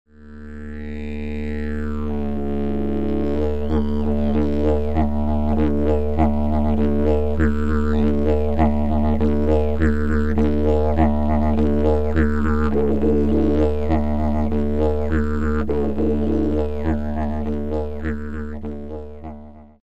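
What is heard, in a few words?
oak, didgeridoo; Eiche; die Qualität geht so...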